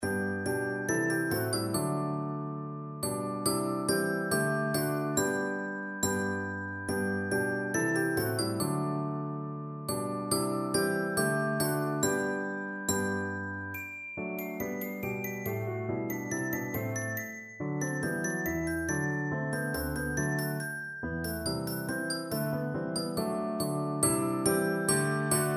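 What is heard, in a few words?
ding dong, glockenspiel; percussion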